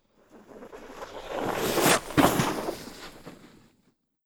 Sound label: snowboarding with jump